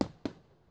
PERC COMBI; Crypton; DrumBase5000

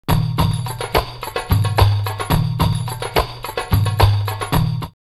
Beirut 108, drumloops World & Ethnic; X-Static Goldmine

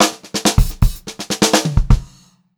Pop-Rock Drumloops; 126 POP

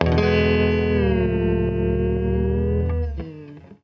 HAWAI, COUNTRY; USB Soundscan vol.31; Miro s Grooves; AKAI

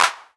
Claps, Roland SPD-S

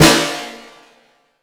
SNR, Drums; Acoustic and Electric; Polyend; Simulacrum Sounds; 1 Shots

